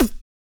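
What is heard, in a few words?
vox percussion, Vocal Planet Jazz FX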